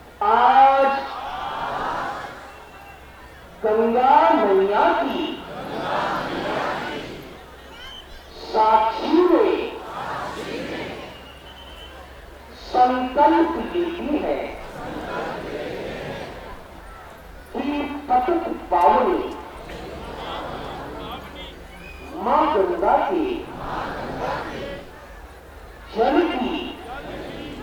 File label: Ganga Aarti Ceremony Haridwar, Religious Music; Samuel Corwin; Selected Field Recordings from India and Nepal Volume II Religious Music